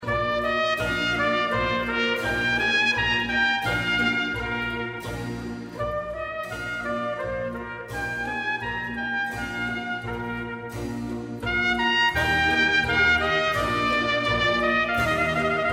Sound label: trompet; vinter